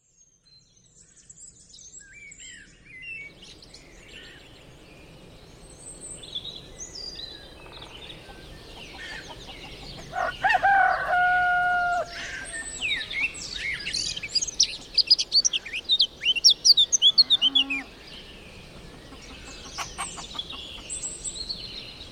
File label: bird sounds in the forest